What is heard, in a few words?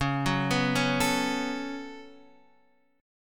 C sharp 7th Suspended 4th Sharp 5th C sharp Guitar Standard; arpeggiated; chord; x,4,4,4,2,5